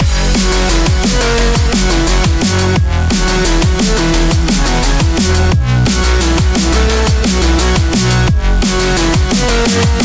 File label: loop